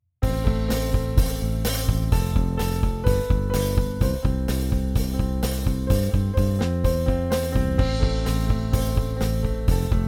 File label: bass lesson